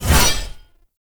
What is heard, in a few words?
Blade Swipe; melee; weapons; Warframe; Dagath Blade And Whip